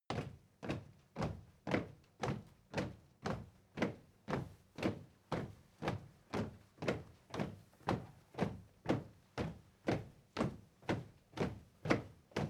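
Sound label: step crowd march quiet sequence, footsteps; schritte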